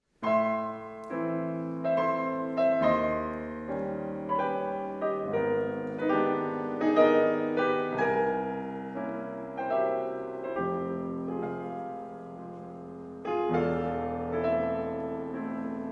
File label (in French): Violon